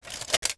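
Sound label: Armour Movement; Civ4 beyond sword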